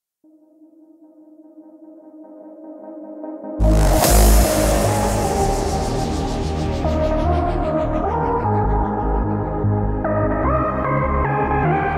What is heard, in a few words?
happy birthday hardstyle remix